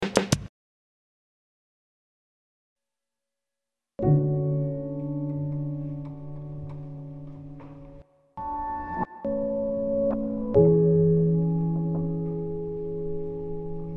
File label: Roland SP 404Mk2 Piano Slices